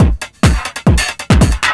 drum 138, Techno; Techno ID; PS-45

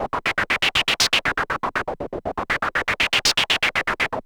Gated Seq Noise, 120BPM

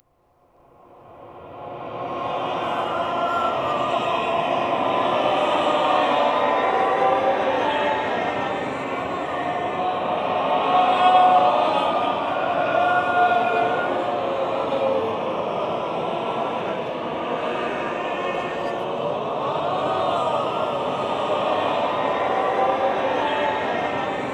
WARMUP REV

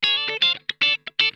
OCTALICK, Funk Guitar; Zero G - Funk Guitar

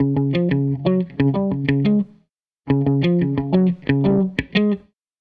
Strat Guitar Loop

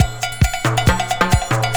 Percussion Loops, Brazilian; Houseworx

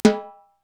TIMB HI2, Korg Electribe EMX-1

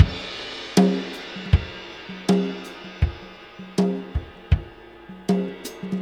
Tom Groove, Laidback

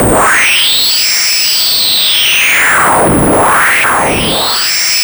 horrid, farty; Zero-G Ambient 1

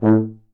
A2 TUBA

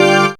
organ, c, Garage Organ; Dance Synths

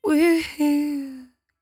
57bpm Am, Velvet Vocal; Zero-G

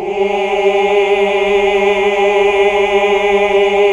ooh; Best Service Gigapack 1

